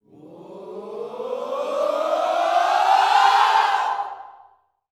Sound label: SWHOOPS, Vocal Planet Gospel yell shout; ILIO